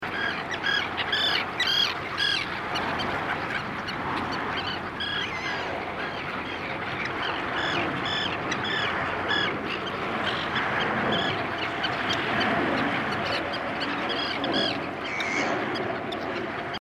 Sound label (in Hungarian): küszvágó csér hangja